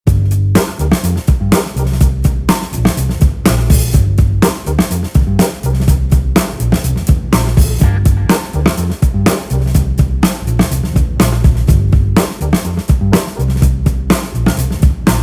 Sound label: motown blues